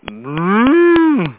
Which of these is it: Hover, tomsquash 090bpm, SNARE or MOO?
MOO